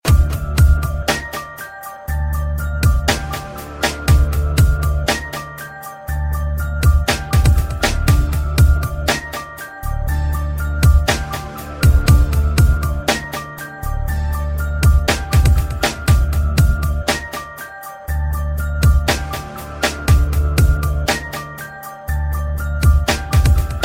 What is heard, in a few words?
Hiphop Scary Echomix; toque